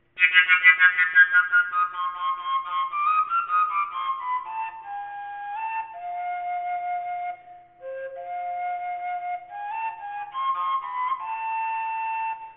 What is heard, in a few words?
koncovka f big, overtone flute; whistles